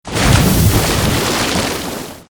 water throw

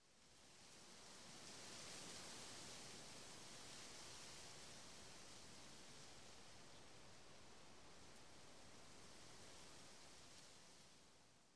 ambient city wind gust

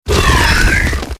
elemental earth attack; mobs

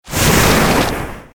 water throw cast

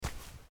biped run

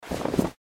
inventory open

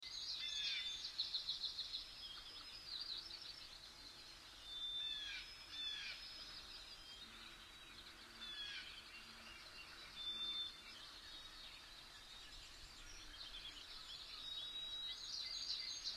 ambient city wind

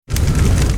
pyroblast cast

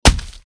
impact blunt

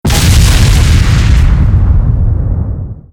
catapult

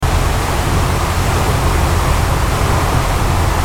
waterfall

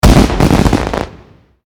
firework effect; abilities

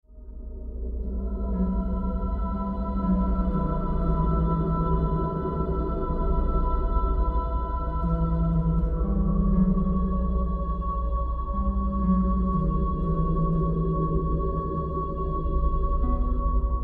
music mystical ambient

